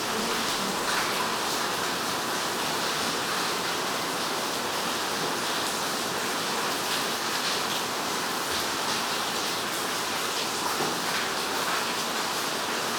heavy rain on a porch loop; stormwaveaudio

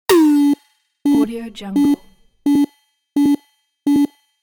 user interface glitch faulty error

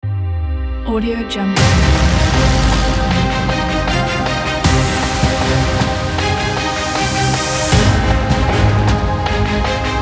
epic action logo intro

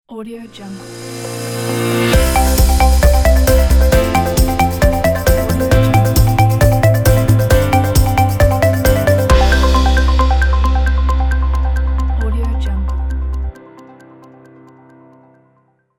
corporate innovation logo; codemusic

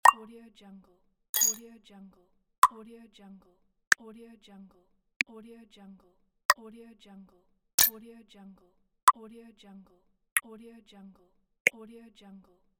button clicks

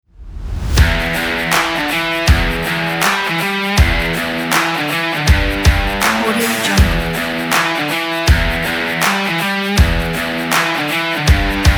indie rock

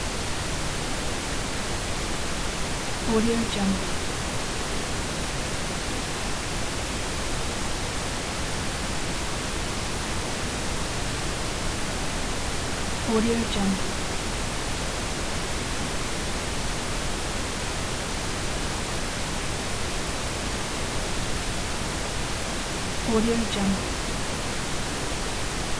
test tone pink noise; prosoundfx

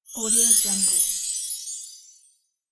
star dust

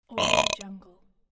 burp

